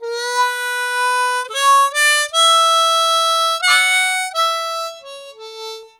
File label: Harp Excerpt